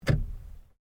Car Toyota Starlet stalk movement; Toyota hire car in Japan; Cars; Vehicles

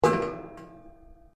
METAL ON METAL; Metal (Sound Effects:Materials)

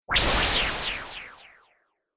Laser Swish Delay, whoosh sound effect

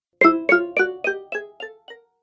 App Delay, ping; multimedia

